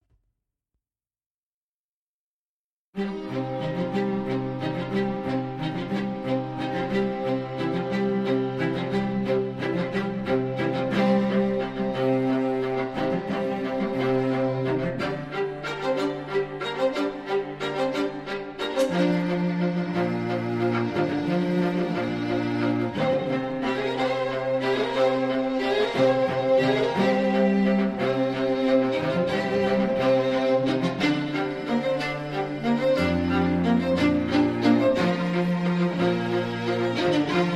strings

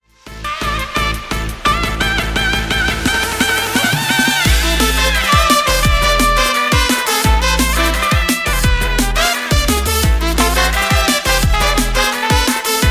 sax, Solo Saxophonist

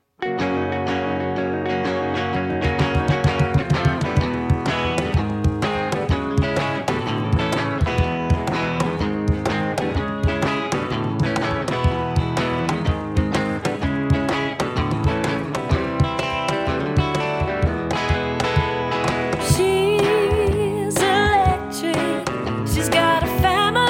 voices

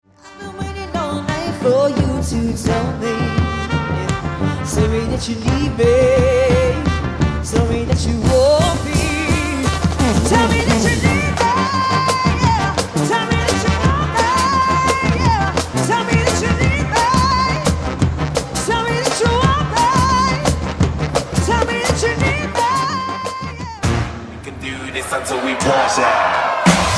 brass

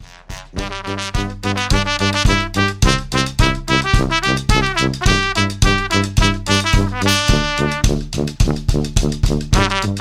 brassy, Roaming Brass Band

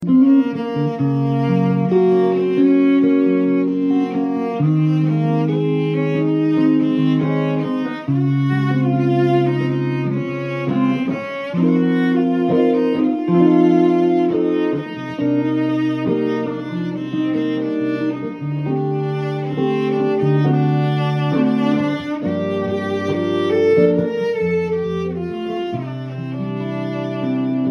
strings

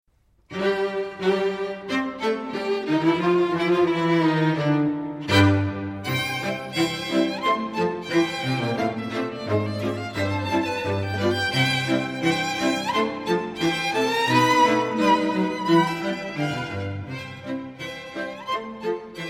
strings

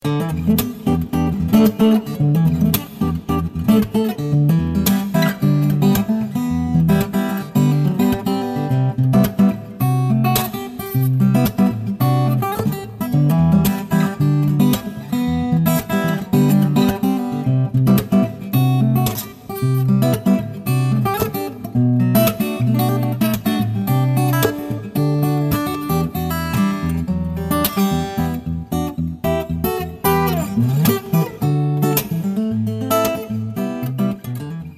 guitarist

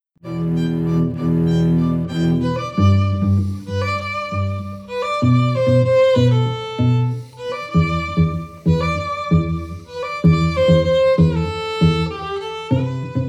quartet, Female North West String Ensemble; classical musicians

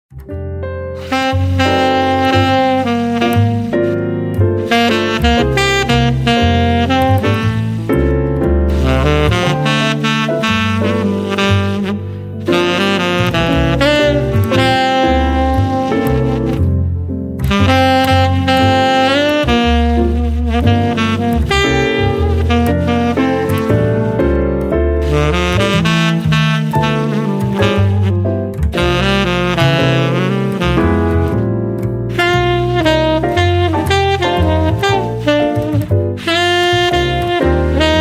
jazz; jazz swing bands

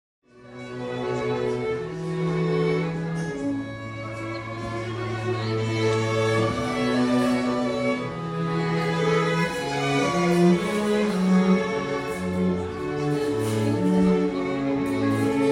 strings

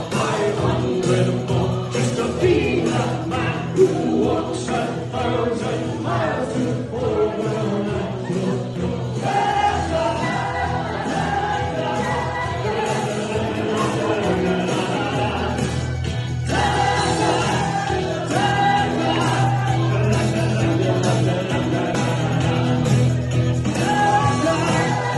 singing male female live

